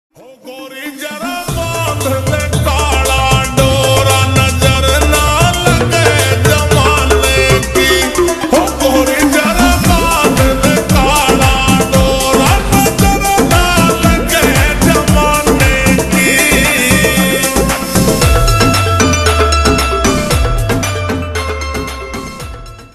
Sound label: Slowed Reverb Ringtone